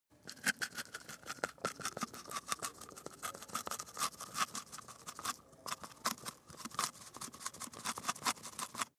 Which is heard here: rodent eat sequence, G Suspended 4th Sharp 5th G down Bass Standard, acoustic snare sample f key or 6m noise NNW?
rodent eat sequence